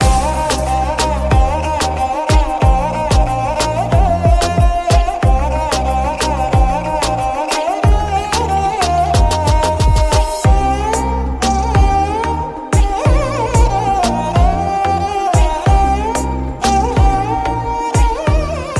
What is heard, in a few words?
Instrumental Ringtone